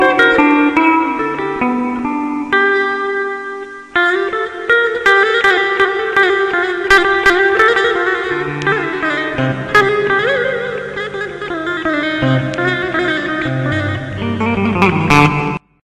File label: ankarali turkish